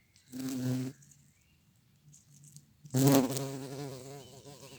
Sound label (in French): Bourdon bzzt; Vols Bourdn abeilles